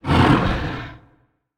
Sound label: boss, monster; Balierd